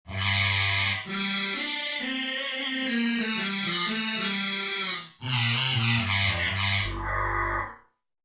Growl Brezz; Korg MSC-1S Variety

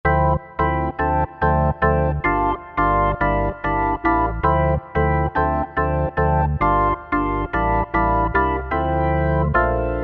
M1 Organ

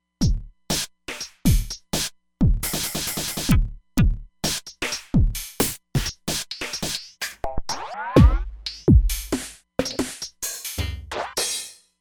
Nu Technica, Roland Fantom XR Drums

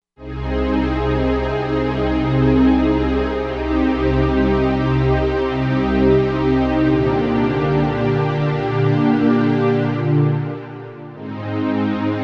12 Strings

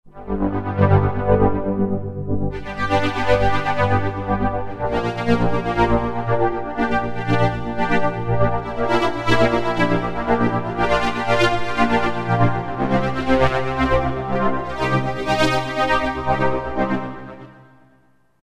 Trancing Pad, Roland JV-2080

